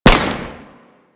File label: Nail Grenade Shot; Warframe; Trapper